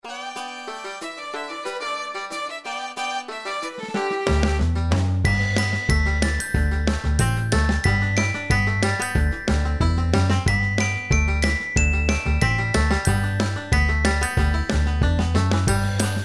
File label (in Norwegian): Improgitar